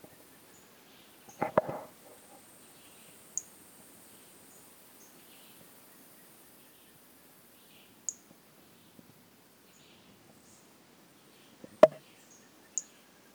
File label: Brachycephalus albolineatus